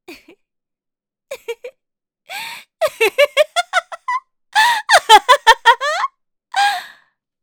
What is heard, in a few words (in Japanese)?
あははははー！（狂気的な笑い; リクエストシステムボイス